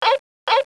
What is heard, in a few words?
Laser Lab Sound